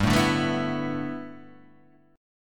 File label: E 7th Suspended 4th G, guitar; E7sus4/G strummed; JGuitar